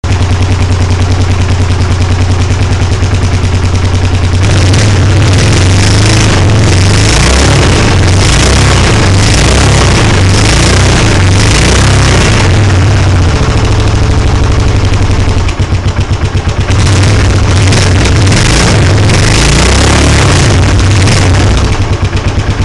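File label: Savage Stock, exhaust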